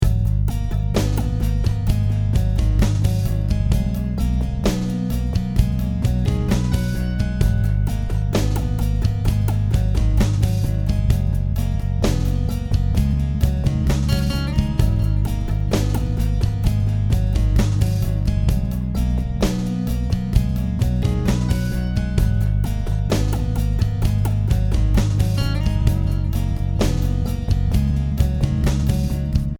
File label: slow groove